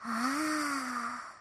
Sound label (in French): ETONNEMENT; MIRU; mirumiru